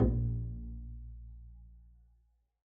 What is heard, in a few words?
pizz bb1, double bass; dsmolken